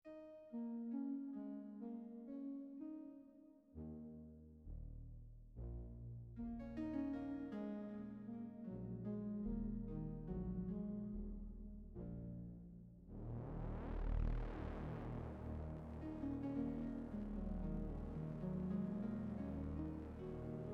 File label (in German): OSMOSE perkussive; EXPRESSIVE E; astral titans